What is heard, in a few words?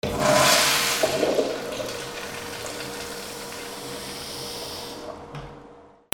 BATHROOM